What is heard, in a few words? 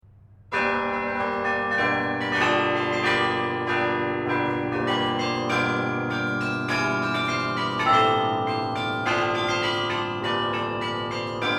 beiaardbock Marche; De Gruytters beiaardbock; 1746; Marche van Hartop